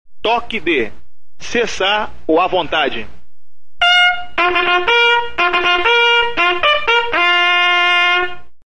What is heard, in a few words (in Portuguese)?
Cessar A vontade, toques de corneta